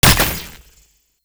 Sniper Mech; Warframe; Sentient; Caliban Prime

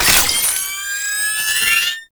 Sniper Drone Charge Up; Warframe; Lasrian

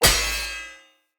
Short Sword Medium Impact Metal Shield; Weapon Impacts; Player; Swords; Combat

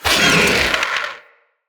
Spider Vocal Pain; Vocals; Root Spider Mother

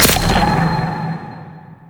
Maw Fishing Fish Impact; Warframe; Duviri

